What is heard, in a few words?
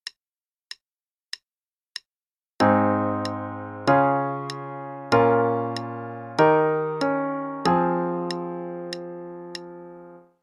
Chord Progression - Ab Major (qn=96); CM Level 6